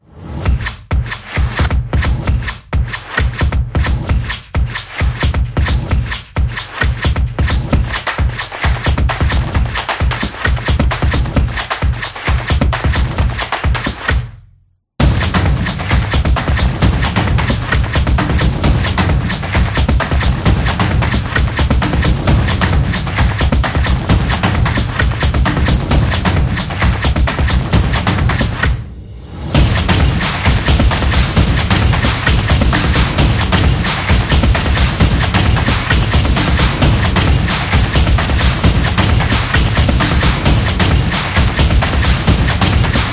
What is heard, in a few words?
Fun Beat